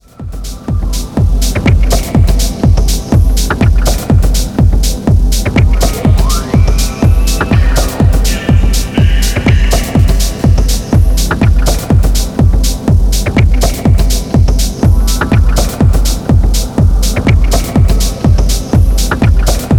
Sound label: saw